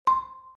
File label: countdown end